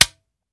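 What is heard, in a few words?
charlie725 fire first player, weapons; gmod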